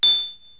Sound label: chime; Multimedia Cube